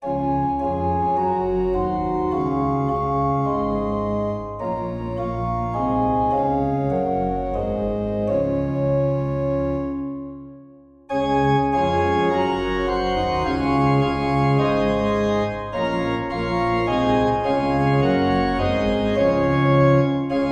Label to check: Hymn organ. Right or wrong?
Right